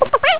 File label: cluck, chicken